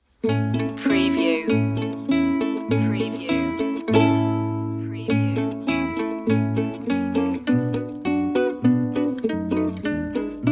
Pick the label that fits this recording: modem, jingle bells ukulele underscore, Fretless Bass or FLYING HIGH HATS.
jingle bells ukulele underscore